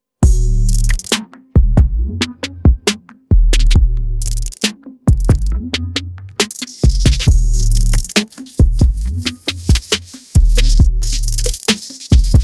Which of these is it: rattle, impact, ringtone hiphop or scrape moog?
ringtone hiphop